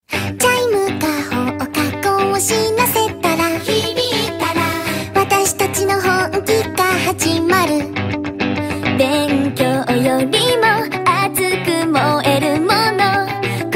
Scorching Ping Pong

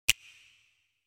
finger snapping, sms; ringtones

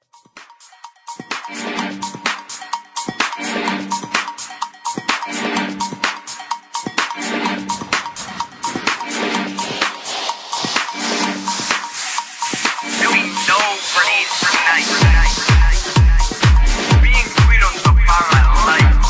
beat

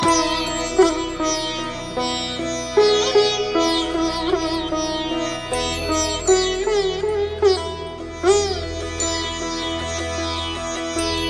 classical music

